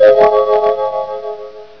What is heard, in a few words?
magic; sunrisechat